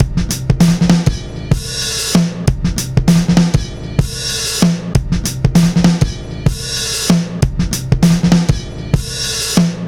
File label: 97 bpm; CATCH 22; 90 s Sample CDs; Planet of the Breaks